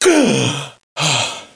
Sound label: gasp; SINDEMO; player; blade